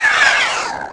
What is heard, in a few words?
Arachnid Die, Aliens